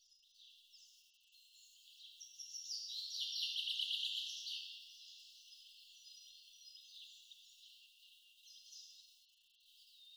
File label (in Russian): Blagovest i zvony Velikoj lavrskoj kolokolni